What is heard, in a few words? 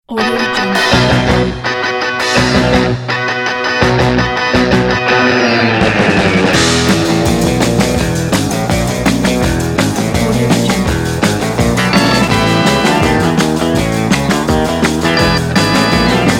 rock that surf